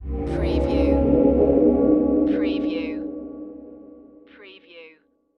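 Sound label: Production Element Stab Music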